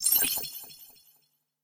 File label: continue button; power jackpot win